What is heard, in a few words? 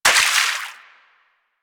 Water Sprayer Surface Impact; Warframe